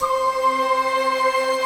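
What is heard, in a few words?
Japanese flute, Big Japanese Flute; Optical Media International; Sonic Images Library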